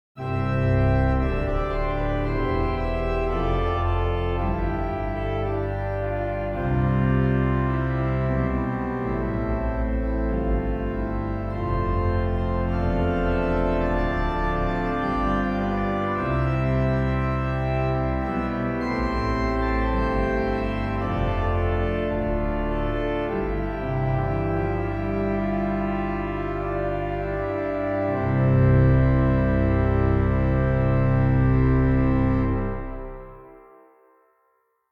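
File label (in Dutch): uitbreiding trombone, orgel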